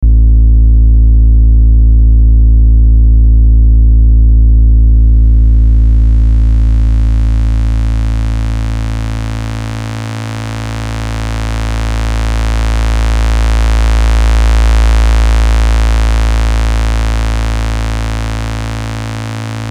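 Buchla Easel V Complex Oscillator Pure then Pulse Train No Timbre Mod; Arturia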